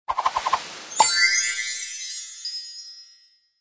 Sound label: Appear; Pipin